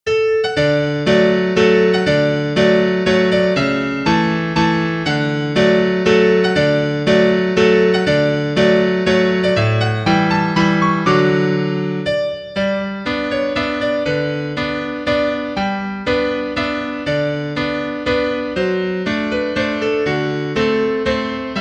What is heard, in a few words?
Country Dance piano